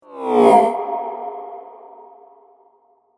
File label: Future Vroom, whoosh sound effect